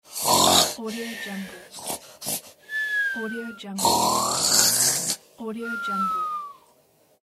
cartoon sleep; nerso-77

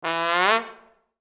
high comedy trombone rip

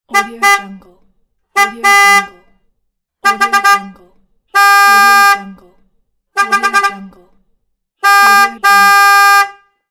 honk

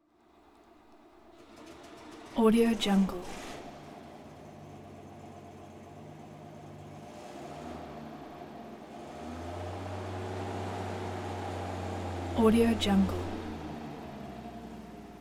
1973 toyota land cruiser fj55 creeping; prosoundfx

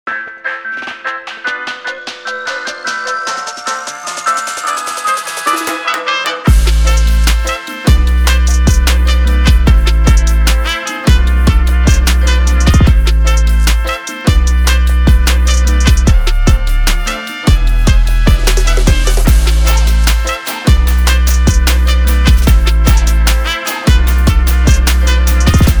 modern latin hiphop

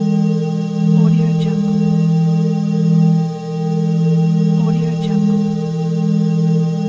healing pod

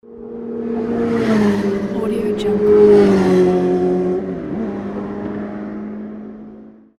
two motorcycles pass by; alexm76

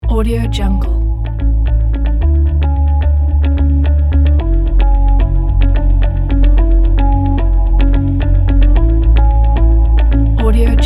corporate technology kit

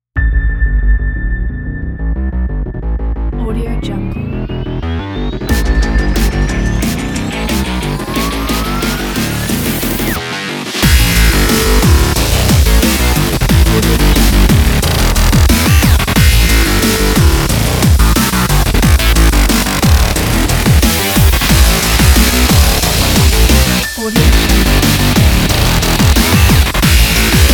dubstep trailer

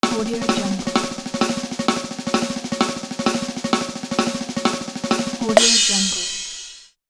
drum roll

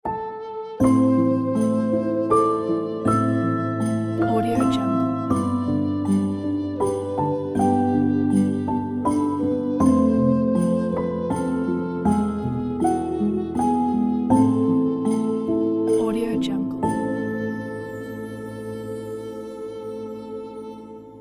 soft piano what child is this

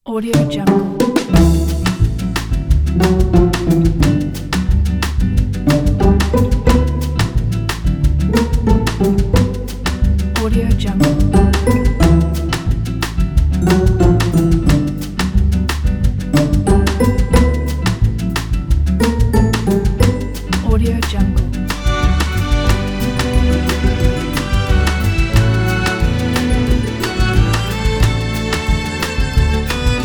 sweet seduction

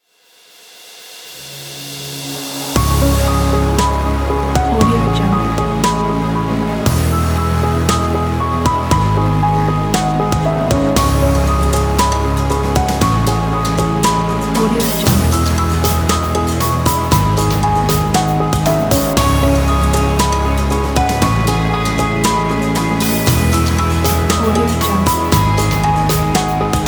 short cinematic piano

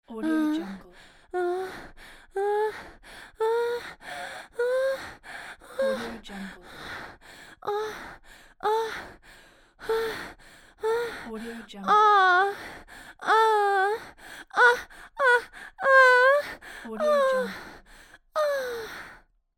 moaning

